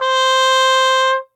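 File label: trumpet C hi mute cup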